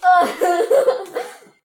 giggles and laughter; SyrinScape

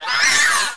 moan; The Elder Scrolls III - Morrowind

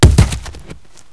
body fall heavy; The Elder Scrolls III - Morrowind

